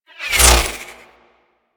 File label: Cluster Shot Whizzby; Enemies; Abilities; Teralyst